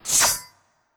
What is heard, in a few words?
Impaler Earth Ready